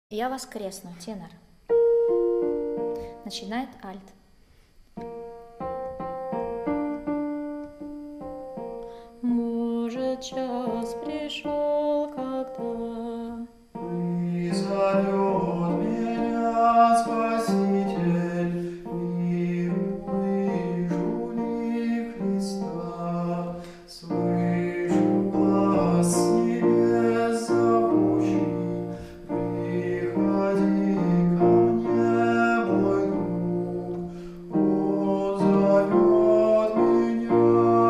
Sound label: tenor; JA voskresnu